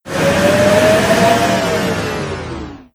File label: mri, hospital